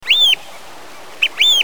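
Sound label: Eurasian oystercatcher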